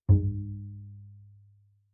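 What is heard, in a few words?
Bass pizzicato fortissimo sul E Ab1, Double Bass; MIS Pitches - 2014; Strings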